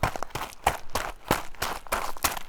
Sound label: footstep